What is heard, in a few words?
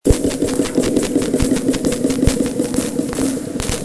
clicks, orca